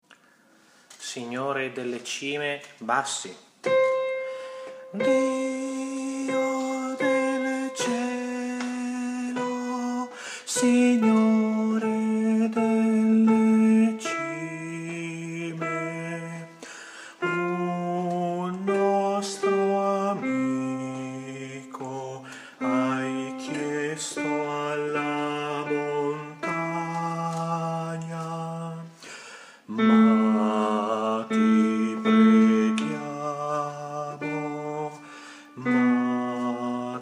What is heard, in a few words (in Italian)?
BASSI